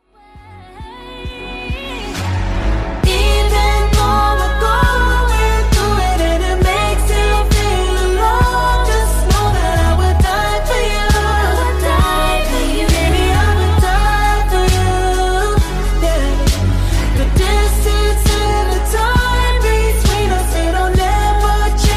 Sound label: remix english song tone ringtone